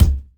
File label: smooth kick single hit c sharp key; kick drum samples